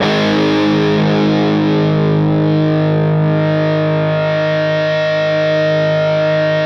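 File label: fuzz chord, fuzz guitar vibrato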